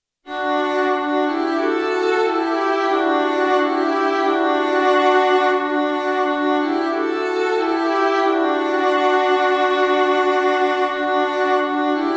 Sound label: Violin Fun